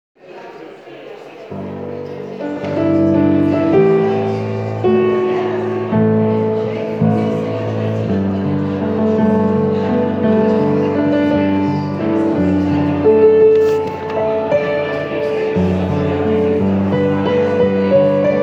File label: Sopranos